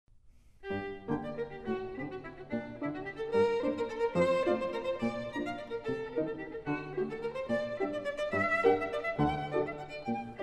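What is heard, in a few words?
violin sonata; saint-saens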